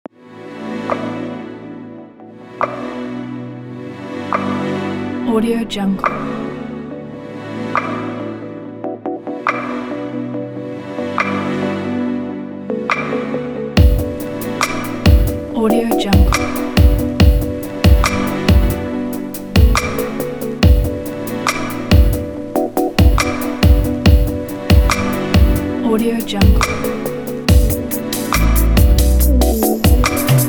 sexy modern and fashion hip hop

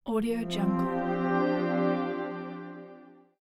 dark reveal; backgroundsounds

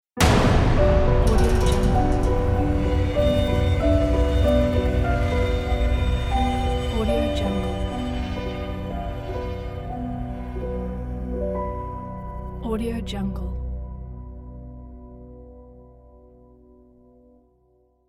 dark piano intro logo

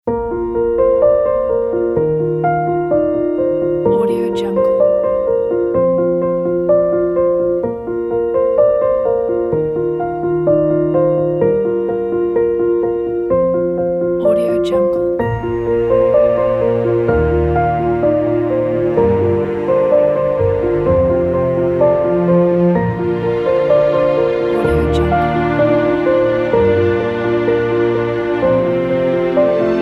emotional and inspiring cinematic piano

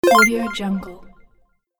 bonus alert

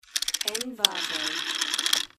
rotary telephone dialing

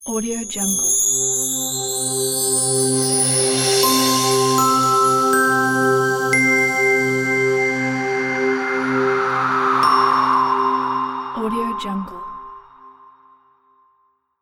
christmas logo; docwaxler

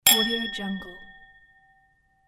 champagne glasses clinking

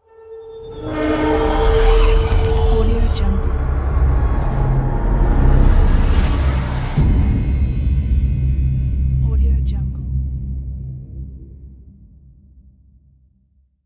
logo reveal

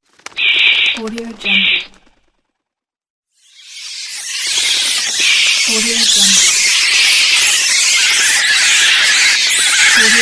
bat chirp and bats flyng pack

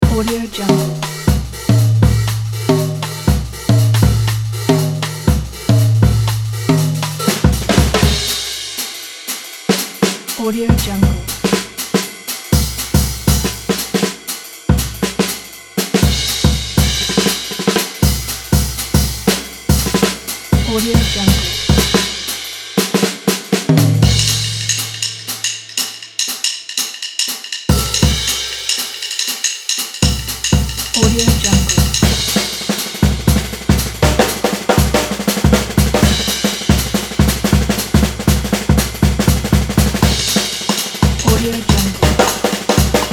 old school jazz improvisation on drums

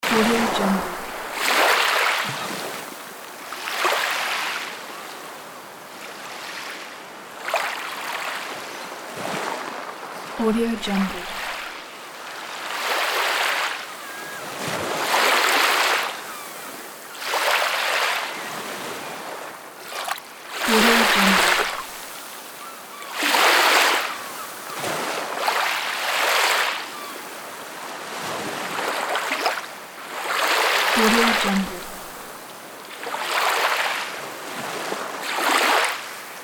beach waves

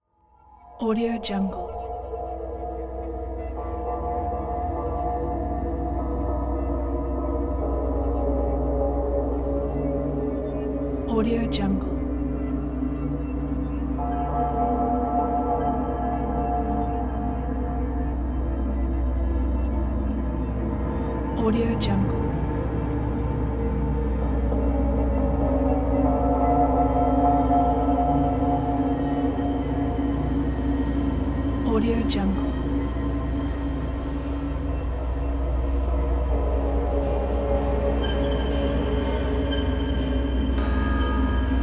suspense horror background ambience

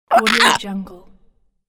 bad bird strangle

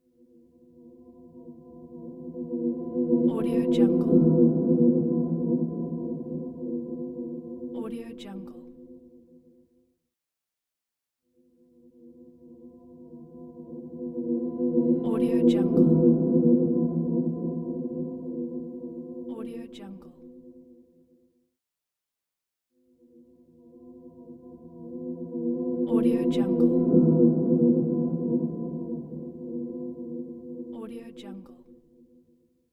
eerie ghost

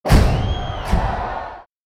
pyro explosion

